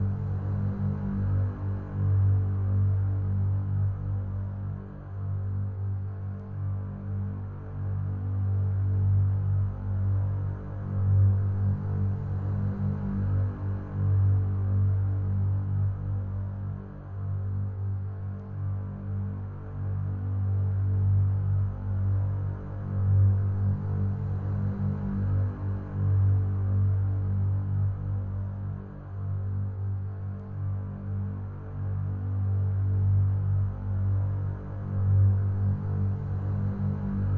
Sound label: Ambience Layer A Strings; Endless Hell